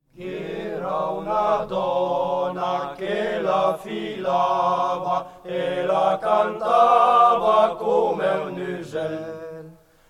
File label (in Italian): Gh'era una dona che la filava (coro); BRIANZA PRIMA PARTE - CANTE, CONTE, CORI, FILASTROCCHE E BANDE DI FIRLINFEU